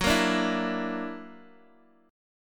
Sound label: Gb Augmented 7th, Guitar Open D; chordshape; down